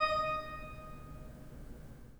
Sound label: pipe organ, 3-D Audio; E-MU Producer Series Vol. 5 – 3-D Audio Collection; 3D Organs; 3D Pipes Dr Joyce